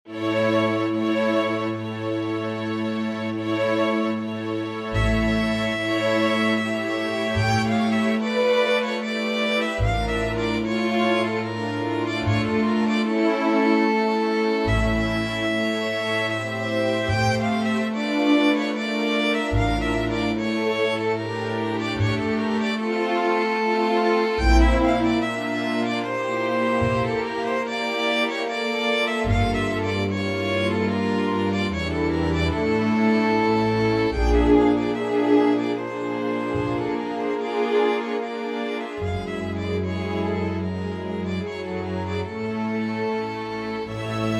romanian folkdance, string ensemble; bartok

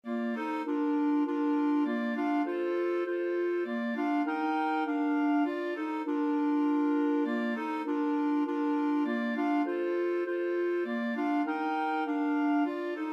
clarinet trio

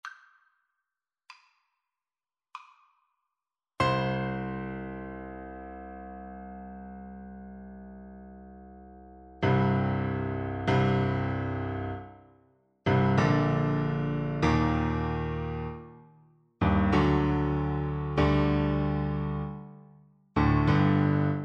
trumpet

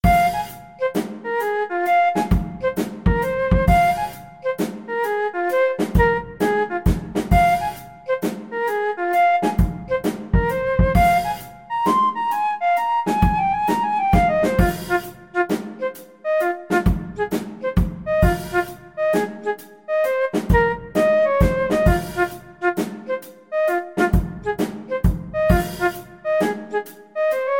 Funk Study, flute

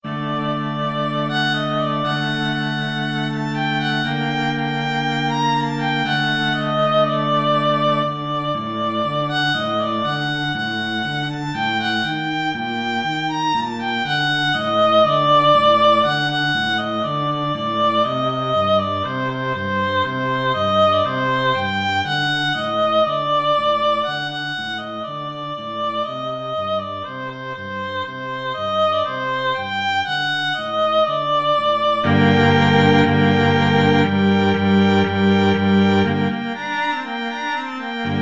hava nagila, violin cello duet

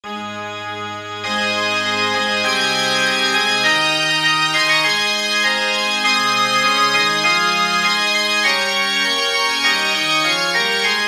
ORG, organ

